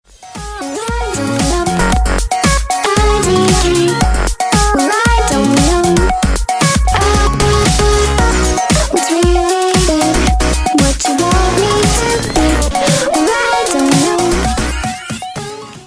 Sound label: Kawaii Pop